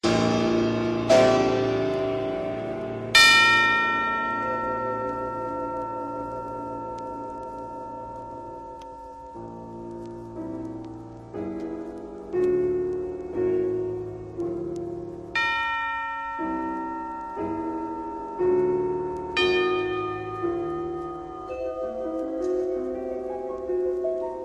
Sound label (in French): Les cloches Percussions; Pierre Gabaye